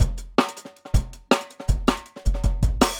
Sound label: 160 live jungle, breaks